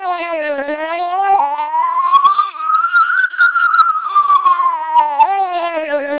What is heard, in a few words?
siren, TWELP 480 bps vocoder; non-speech signal